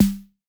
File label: SNARE, Sound-Master SM-8